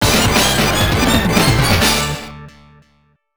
(Stage Clear); undercover cops irem arcade gamerip

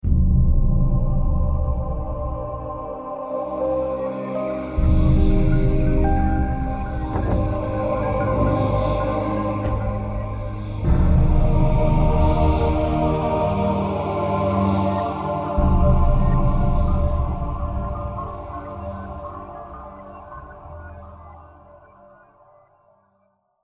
Success; assassin s creed; Acre

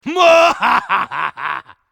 Knight Cheer; Angry Birds Epic; Android

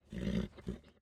horse grunt; teardown; ps5 windows xbox series xs

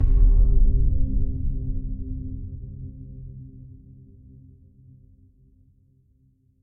Tessellation Combo; Mixolumia

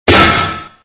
Metal Impact

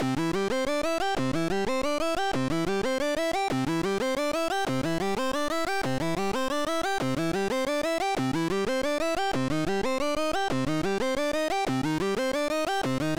Poly Arp

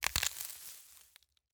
foliage break; Teardown; PS5 Windows Xbox Series XS

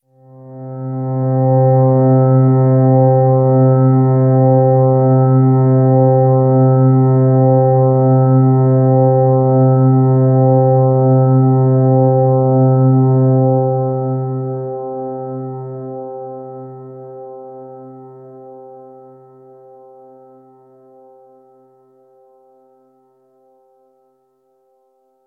Stinger Puzzle Drone

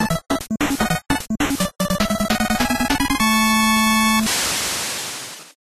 Victory!; Sakigake Otoko Juku Shippu Ichi Gou Sei; NES

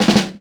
Drum Snare; the princess and the frog; Mansion; Twitch Drums

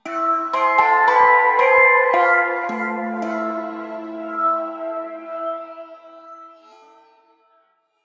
Trophy Theme Stinger

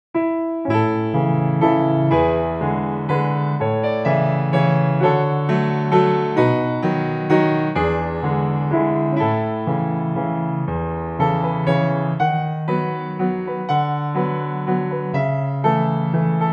Waltz Impromptu

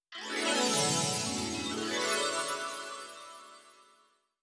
Jingle - Pose; master detective archives rain code original soundtrack 2023; Jingle - Shinigami Pose

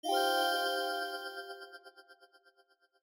Clear Em7 Mid; Mixolumia

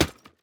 plastic hit; teardown; ps5 windows xbox series xs

